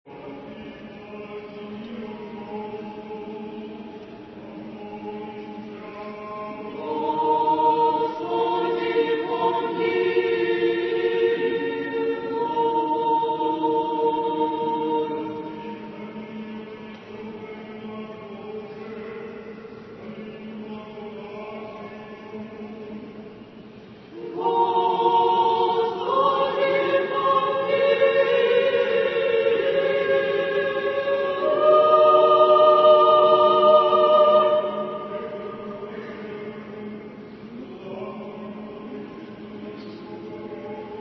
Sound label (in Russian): Malaya ekteniya; duhovnayamuzyka; Russkaya